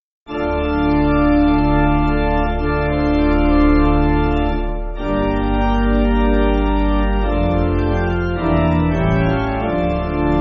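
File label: May The Holy Spirits, pipe organ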